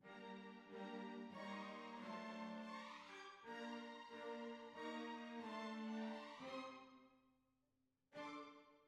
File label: Cbasses